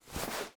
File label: clothes generic foley